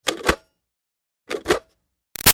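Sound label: Switch Plastic Bubble Machine On Off Medium; Switches; Objects; BigRoomSound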